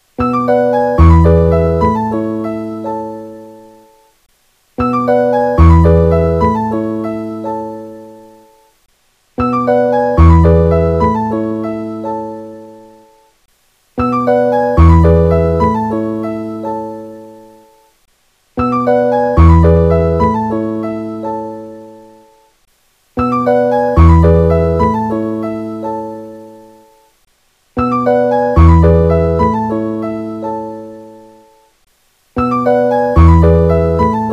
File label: RINGTONE; NOKIA